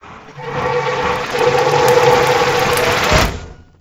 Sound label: fires death